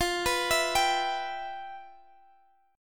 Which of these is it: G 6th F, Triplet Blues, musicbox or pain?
G 6th F